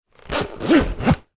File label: household electric razor pouch zip close